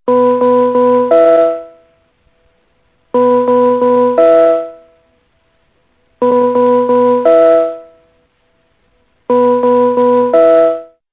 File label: interval signal; bbcws